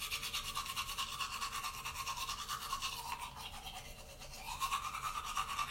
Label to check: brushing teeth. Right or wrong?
Right